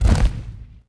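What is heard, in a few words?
stone hit stone medium; objects; Star Wars Obi-Wan; XBOX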